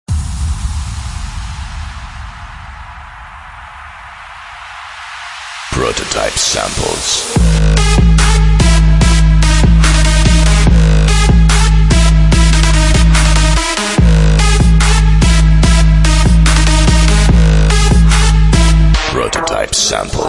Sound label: TRAP KITS